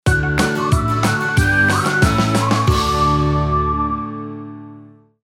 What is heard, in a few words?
Jingle